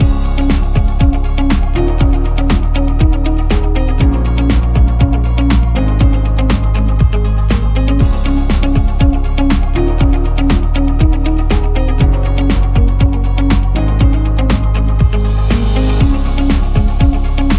A Corporate Loop; corporate interview

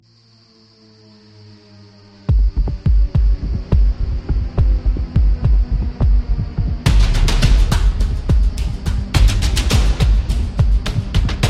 Tense

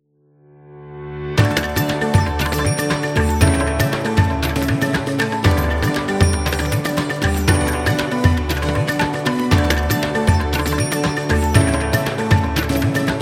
India Travel

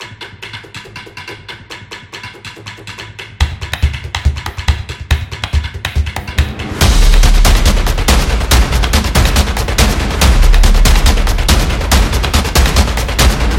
Cinematic Drum

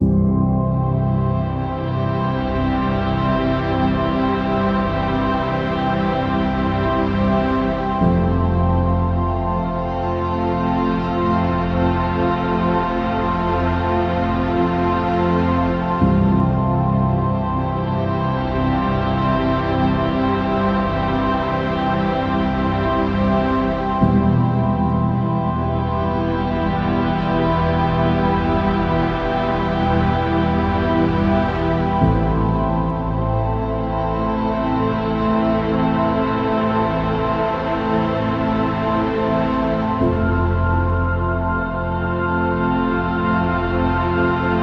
Soundscape; movie trailer

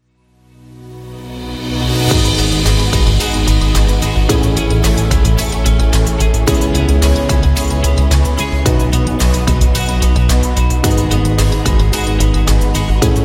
Soft Instrumental; business background

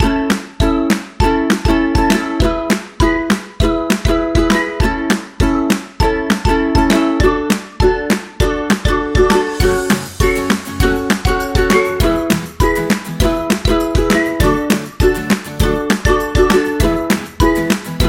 Food Happy; cooking show

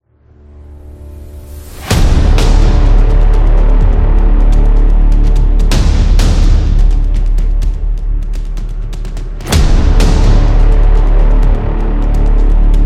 Epic Drums

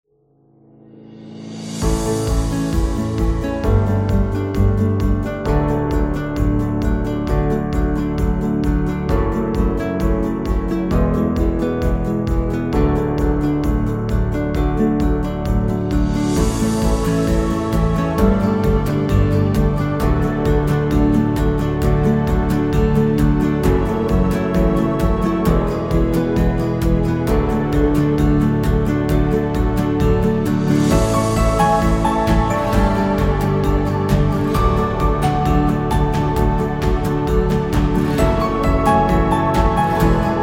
Guitar Music; happy music vol 10

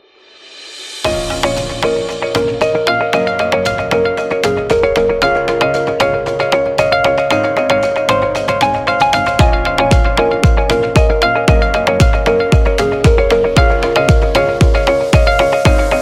Upbeat; technology

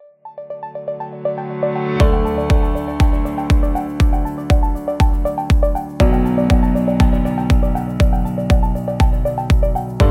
Tech Background

